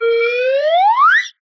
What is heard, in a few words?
slide whistle long crescendo